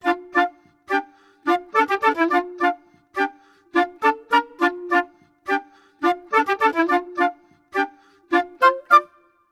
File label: 105 woodwinds, Orchestral arrangements, 105 D min